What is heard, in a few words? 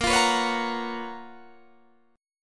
Gb Major 11th